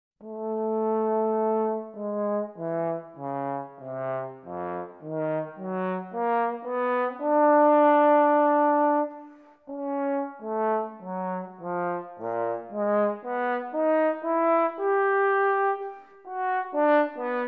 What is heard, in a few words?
trombone